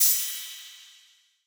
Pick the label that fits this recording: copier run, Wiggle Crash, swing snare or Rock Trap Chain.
Wiggle Crash